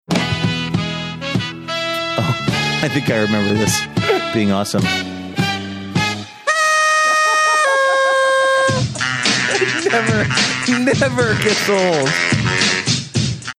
busts out laughing party horn exciting celebrate music